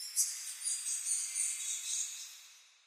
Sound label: amb african birds; source engine; portal2